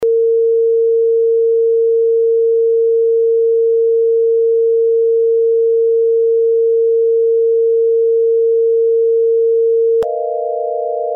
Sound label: H alpha in sound